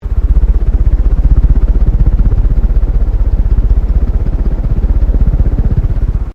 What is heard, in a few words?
Fan ceiling fast above close loop; Fans; Electronics; Various fans